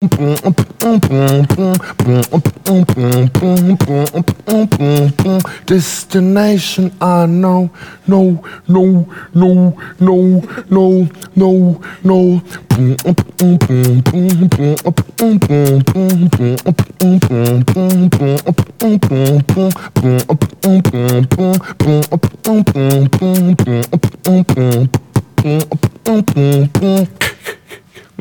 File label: Beatbox